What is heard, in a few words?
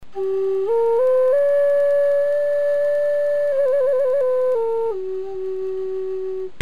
flute